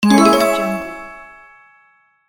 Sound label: magic appearance